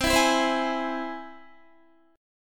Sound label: C Augmented, Guitar, chord; strummed; 19,19,16,17,16,16